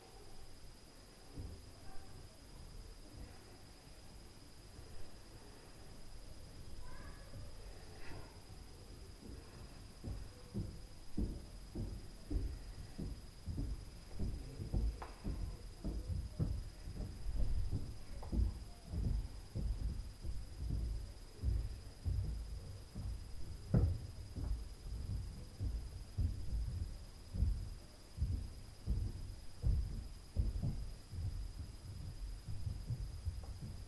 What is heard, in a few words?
Heating + FLOOR + STAIRS NOISE; Night Noise